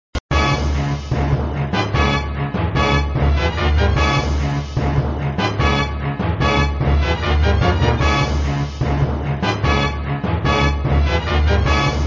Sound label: Music, flipper